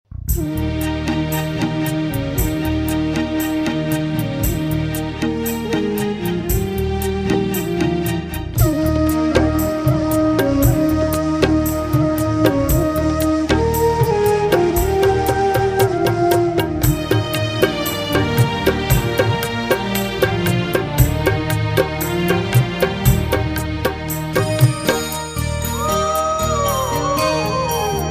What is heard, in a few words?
Karaoke Music